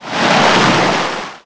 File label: ship bow; shipcharge; Pirates Of The Caribbean